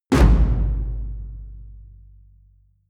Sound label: drum hit loose small; percussion